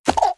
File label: Enemy Poult Walk